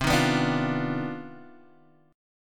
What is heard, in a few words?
C Minor Major 11th C down Guitar Half Step Down; CmM11